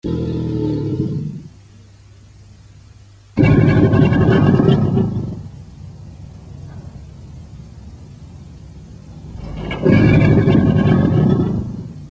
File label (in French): alligator